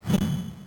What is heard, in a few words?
ball frozen